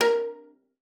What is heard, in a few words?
A#4, VIOLA PIZZ; Xsample VOL-5